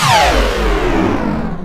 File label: super jump; freak fortress 2